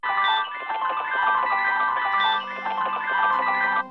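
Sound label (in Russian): гранулированная музыкальная шкатулка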